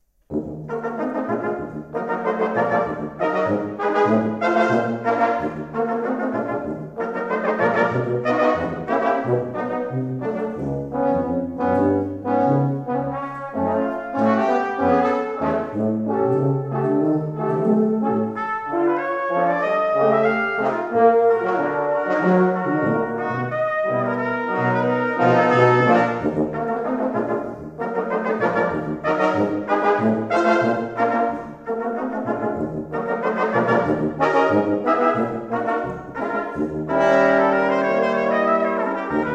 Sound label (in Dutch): Quintet Variatie; Ewald; Quintet 2 opus 6